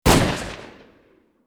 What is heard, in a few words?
Bullet Time; FXHome; Bullet and Gun